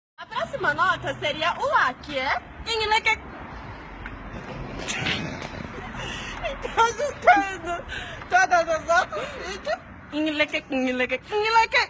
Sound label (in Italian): violino